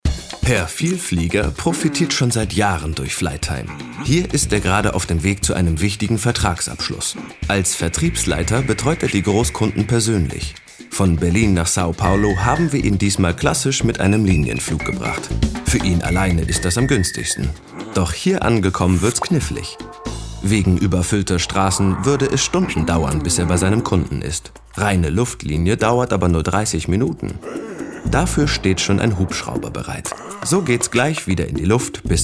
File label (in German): Imagefilm